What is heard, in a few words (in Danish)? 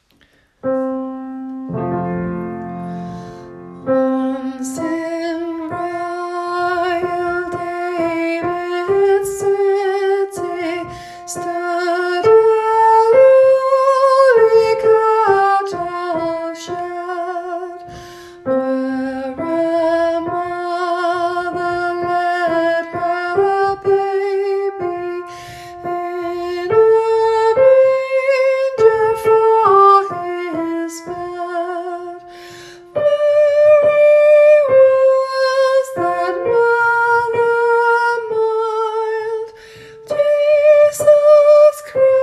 sopran